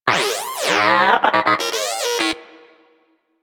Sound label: Moa Inquisitive Vocal Battle Ends; Warframe